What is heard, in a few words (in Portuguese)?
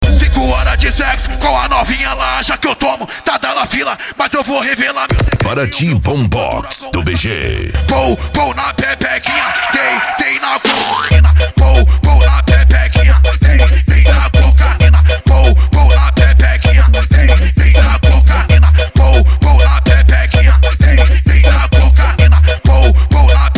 bombox funk